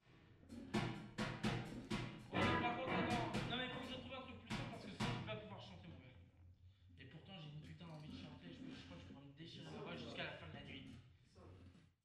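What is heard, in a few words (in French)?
Chanter jusqu'à se déchirer la voix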